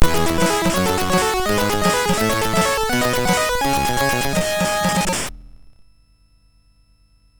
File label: Sound Demo, sid MOS6581R2